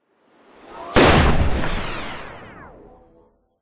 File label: science fiction gunshot with electronic charge and discharge; zapsplat